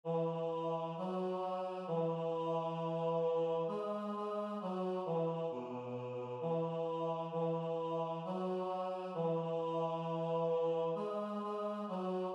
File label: bass; Wardens of varushka